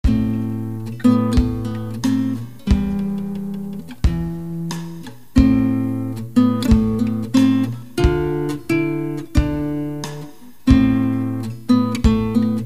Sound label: Drums